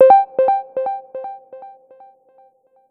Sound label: promote; tromi mobile